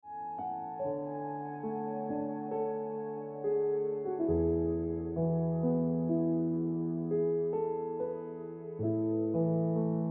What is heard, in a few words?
Ringtone Piano Music